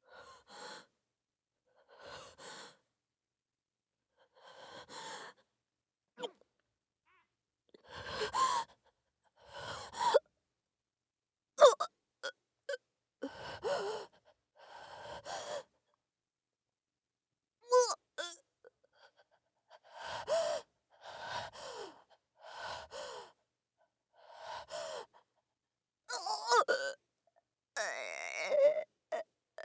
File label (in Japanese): 胸に刃物をゆっくり刺しこむ; 効果音なし